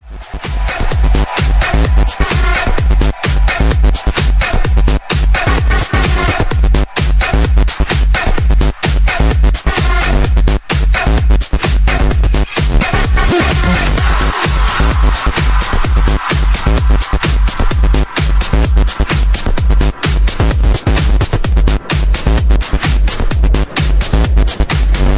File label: Club Beat